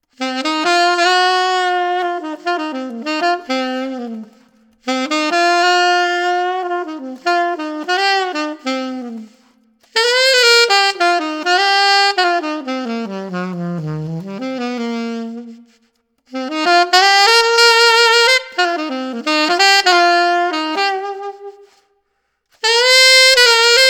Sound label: Reverb, alto saxophone mouthpiece; bettersax burnin metal alto saxophone mouthpiece